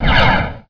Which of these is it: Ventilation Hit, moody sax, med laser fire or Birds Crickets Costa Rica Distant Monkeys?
med laser fire